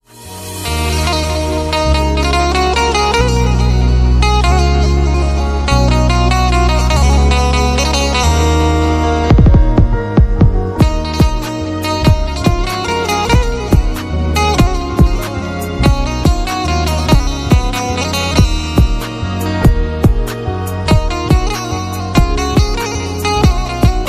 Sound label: Banjo Instrumental Ringtone